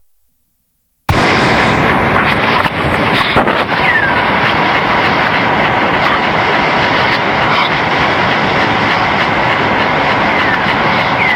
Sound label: bird